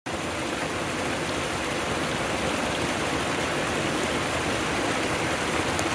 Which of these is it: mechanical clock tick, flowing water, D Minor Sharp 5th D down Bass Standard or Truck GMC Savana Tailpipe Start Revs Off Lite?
flowing water